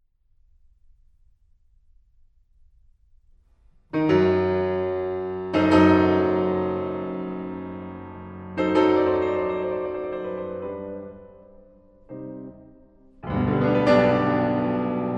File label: Piano Sonata in C Minor, Maestoso; Allegro con brio; Beethoven Works For Piano